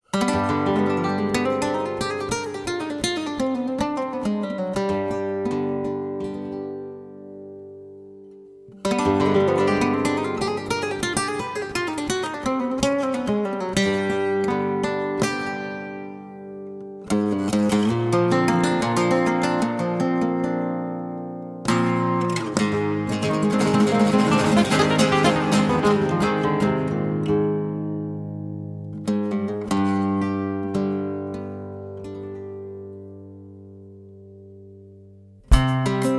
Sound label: Gitarrenduo, Spanish Dance